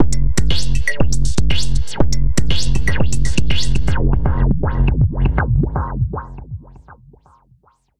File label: Tech Beat 120 fx, techno 120bpm; musicradar; Beats